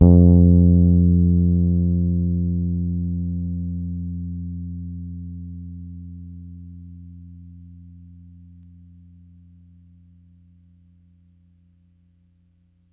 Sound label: As F3 hard, Bass, Pedulla Thunderbass; Giga Samples Collection